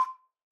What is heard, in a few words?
Ocarina Sus C5 release, Ocarina, Typical; Aerophones; Edge-blown Aerophones; Releases